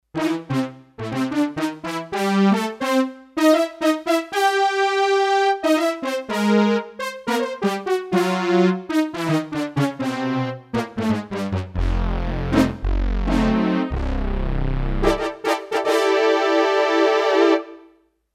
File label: Big ol' Brass, Roland D-10; Factory Patches